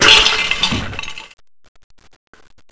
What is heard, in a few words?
bust glass, debris